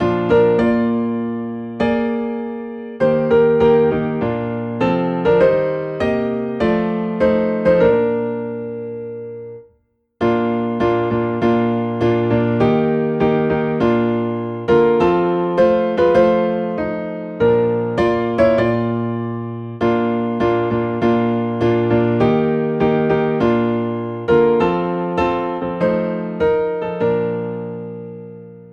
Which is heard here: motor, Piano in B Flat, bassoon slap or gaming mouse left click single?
Piano in B Flat